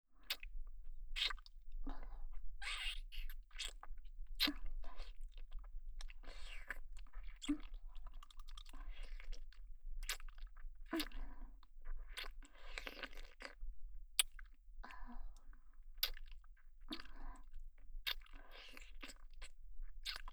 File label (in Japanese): 右 乳首舐め 強め